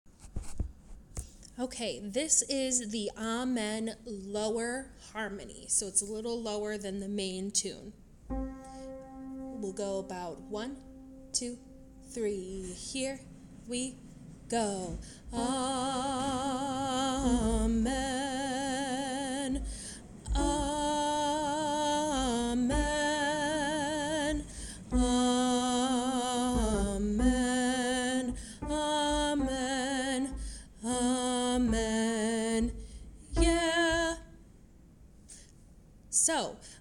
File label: amen lower harmony